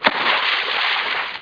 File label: splash